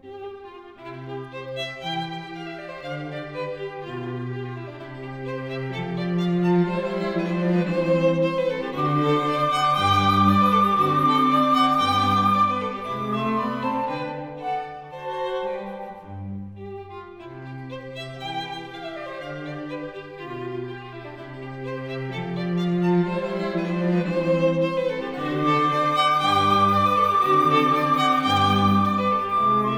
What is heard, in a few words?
flute quartet in C; Variation II; Mozart; K.285b